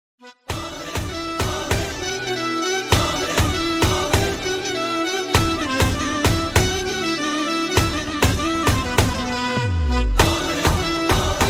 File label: MUSIC SOLO ORIENTAL